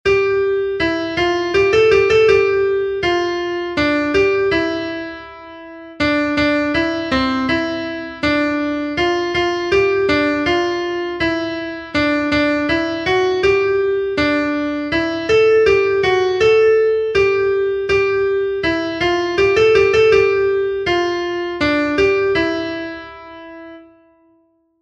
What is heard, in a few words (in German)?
kling glockchen